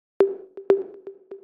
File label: 122 BPM Toms, percussion; percussion essentials 1